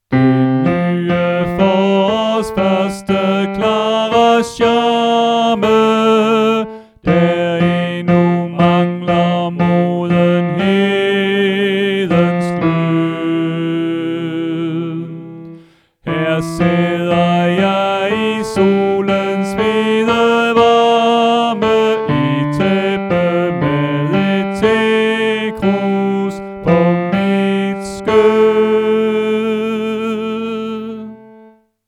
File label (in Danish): Tenor; oevefiler